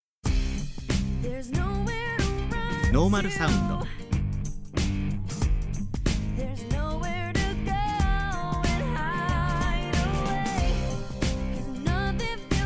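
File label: vocal woman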